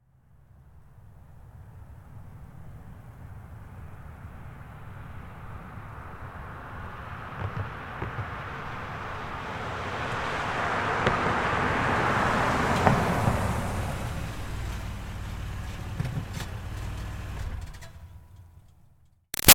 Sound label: Car Highlander Up Stop Med; 2005 Toyota Highlander V6; Vehicles; Cars